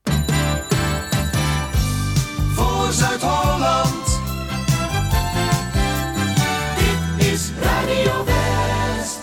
omroep jingles

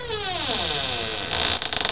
SQUIEK, Deuren; sound effect

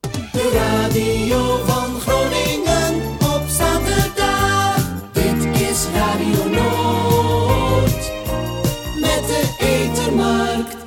omroep jingles

